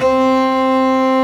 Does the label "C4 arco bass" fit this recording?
Yes